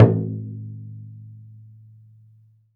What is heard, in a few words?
DA PAI GU, CHINESE PERC; Spectrasonics; Heart of Asia